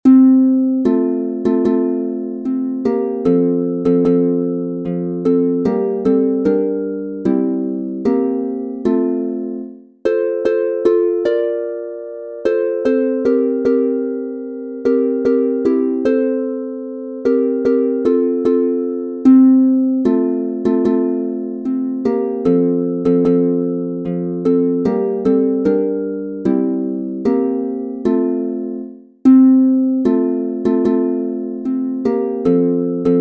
F-Dur G-Dur 3 st instr, Harfe; O Tannenbaum

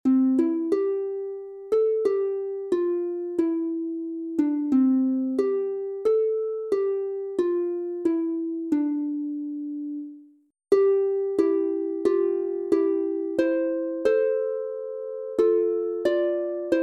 C-Dur 2 st instr, Harfe; Heimat und Heimweh; Im schoensten Wiesengrunde

